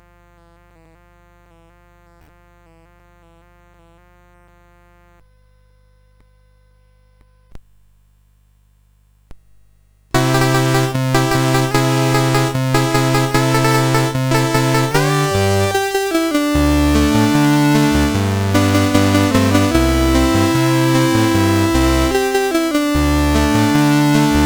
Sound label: CSG8580